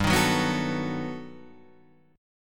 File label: G Augmented Major 9th